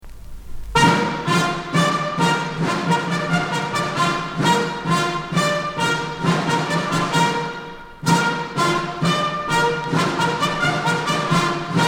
Marche règlementaire, tambours et clairons; 1re marche; Les tambours et clairons de la Garde républicaine

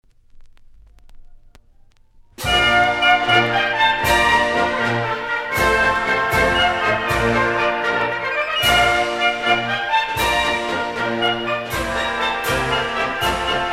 Pas de manœuvre, musique militaire; d'Ozy; 1796; Marches et refrains de l'armée française

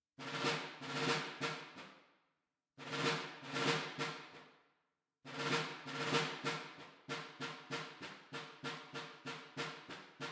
La boiteuse, Tambours; 2d Empire; M. Auger; enregistrement Axel Chagnon

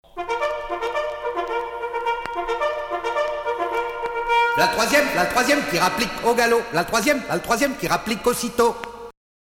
Sonnerie du 3e bataillon de chasseurs

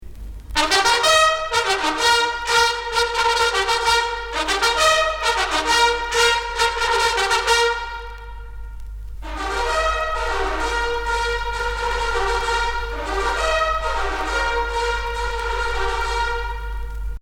L'appel des consignés (trompette), Sonneries règlementaires en fanfare

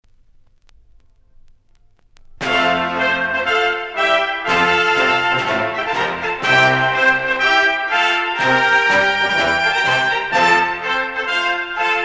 Marche militaire; de Catel; 1794; Marches et refrains de l'armée française

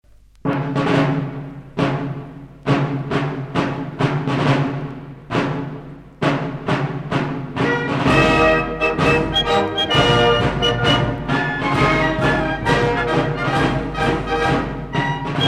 Marche tactique, musique militaire; 1767; chevalier de Lirou; Musique principale des troupes coloniales

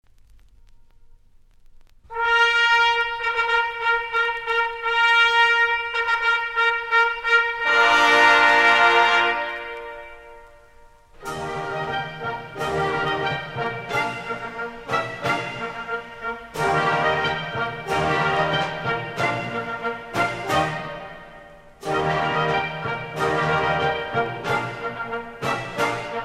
Marche du drapeau des guides, musique militaire; Marches et refrains de l'armée française

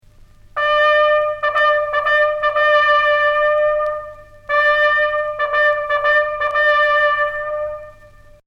Cessez le feu, Sonneries règlementaires

